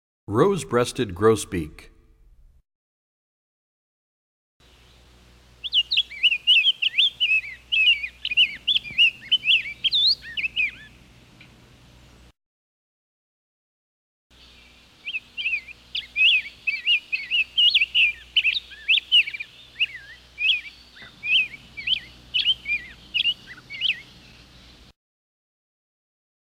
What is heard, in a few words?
Rose Breasted Grosbeak, Bird Songs; Animals; 101BirdSongs